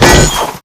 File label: bolt loose; Titanfall 2